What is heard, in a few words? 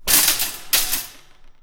bomb cart fall